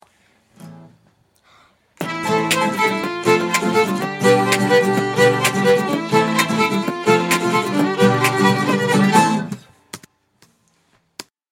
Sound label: fiddle; tamlin